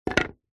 wood piece small set down on wood; foley; zapsplat

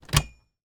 household cabinet door open; zapsplat

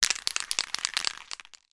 industrial paint spray paint can shake; sound effects one

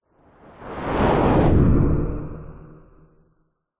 science fiction craft race past zoom fast; zapsplat

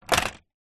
toy plastic large figure set down on wooden floor

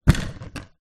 household toilet roll holder empty roll spin

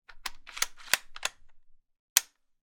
gun mosin nagant cavalry carbine cock dry fire; sound effects one

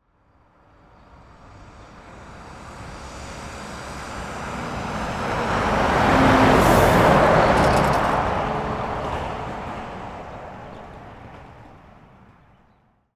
transport truck passes on country road

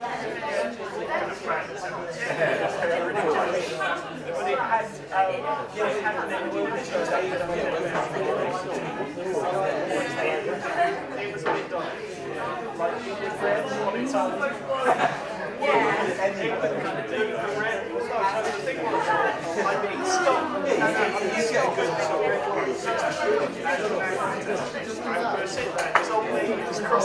ambience bar small; sound effects one

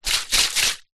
household furniture polish can shake

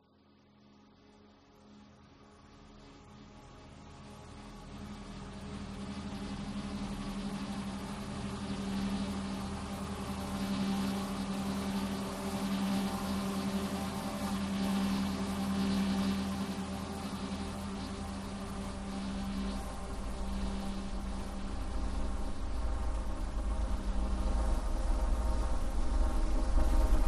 texture glitchy flutter weird sci fi; scott lawlor

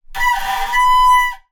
transport bicycle brake squeak loud; zapsplat

